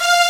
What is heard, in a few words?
Calm Disrupted loop, SNES; Star Ocean